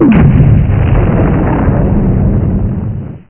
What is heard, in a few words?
bang; Duke Nukem 3D Kill-A-Ton Collection